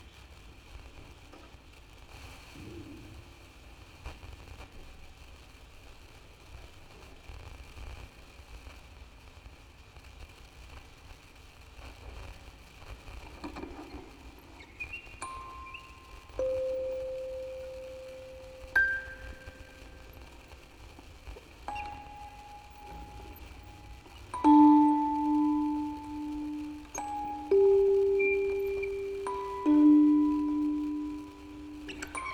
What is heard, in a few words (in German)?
Aufnahme Spieluhren Quartett 4 Kanal stereo